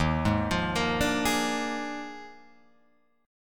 D sharp Major 7th Suspended 2nd Suspended 4th, Guitar, Open F; arpeggiated; JGuitar